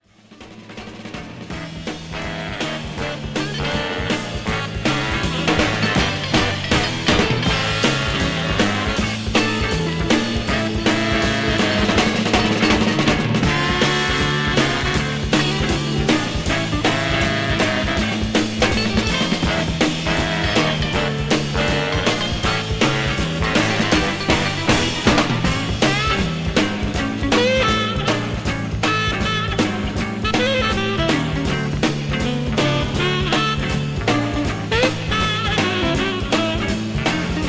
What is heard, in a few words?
soul drums